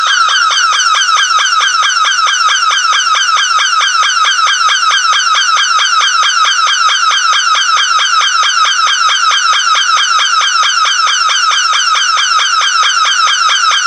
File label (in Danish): Ventilations Alarm